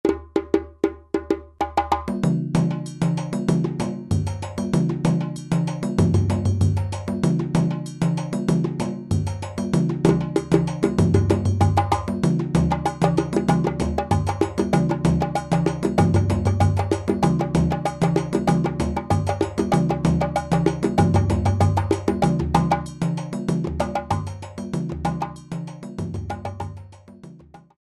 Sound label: 96 bpm, djembe